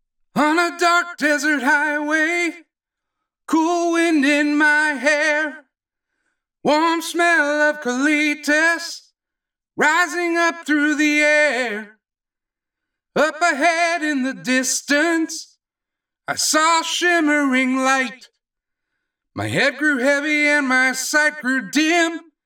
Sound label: singing waiter